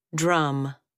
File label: drum